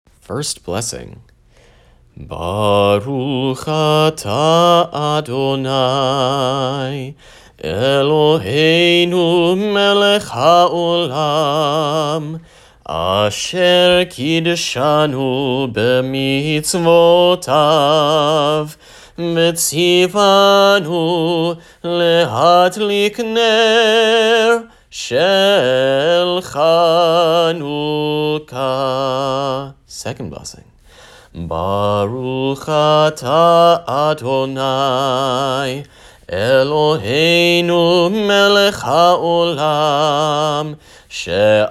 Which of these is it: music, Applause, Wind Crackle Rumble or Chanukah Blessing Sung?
Chanukah Blessing Sung